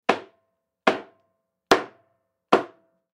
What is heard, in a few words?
Camera Reel 35mm Hit Straighten Multiple; Cameras; Blastwave